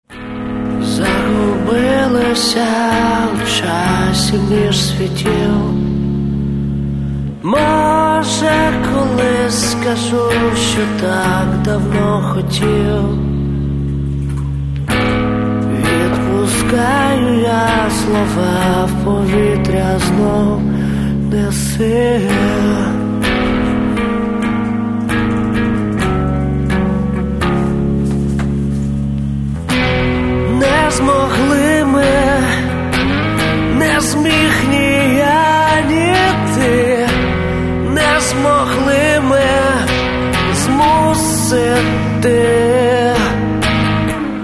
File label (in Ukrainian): akustychny